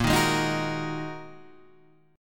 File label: A sharp 7th Suspended 4th Sharp 5th, guitar chord; A#7sus4#5 Chord {6 6 6 8 7 6} strummed